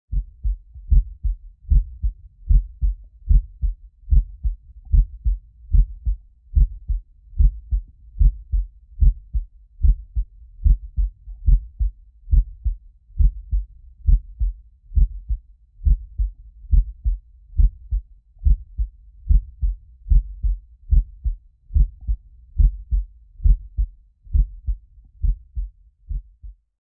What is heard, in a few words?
Heart Beat; Continuous Extreme Low Fr, heart monitor; Continuous Extreme Low Frequency Human Heart Beat; Close Perspective.